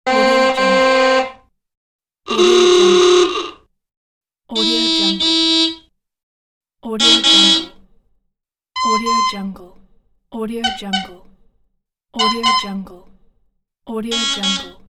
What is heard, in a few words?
vintage car signals; palmirasoundstudio